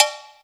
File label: COWBELL, Ragga & Dub Reggae, BELLS KIT; AKAI; USB Soundscan vol.27